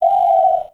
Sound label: Owl Idle Chirp; Warframe; Duviri; Glyph Puzzle